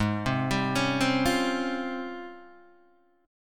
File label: G sharp Major 13th, Guitar, DADGAD